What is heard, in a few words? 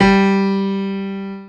single note piano